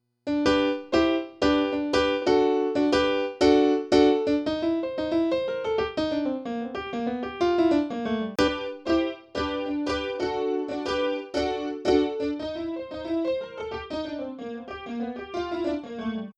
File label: piano; digital piano sound slightly out of tune